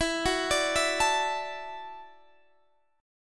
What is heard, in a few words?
E 7th Suspended 2nd Suspended 4th, chord